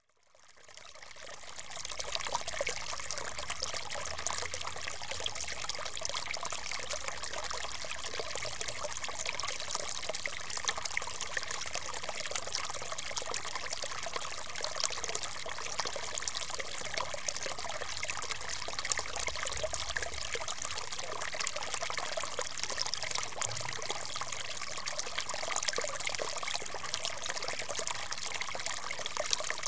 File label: Rivers And Streams, Stream; Rivers Streams Water; BBC Sound Effects Library